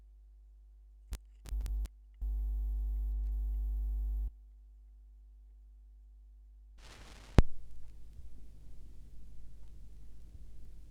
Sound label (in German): Schallplattengeraeusche; rauschpartikel